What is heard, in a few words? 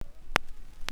Fx Vox; Equipped Music; Loungin House